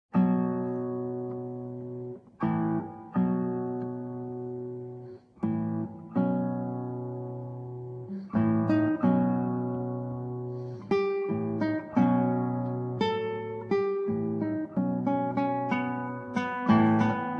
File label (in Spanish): De un fragmento instrumental, Guitarra